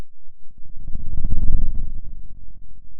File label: Sound of a dinosaur